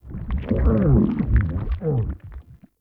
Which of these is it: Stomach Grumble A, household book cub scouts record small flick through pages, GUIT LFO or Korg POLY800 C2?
Stomach Grumble A